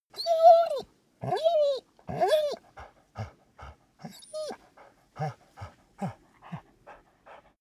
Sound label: dog whine pant; natur-tiere; hunde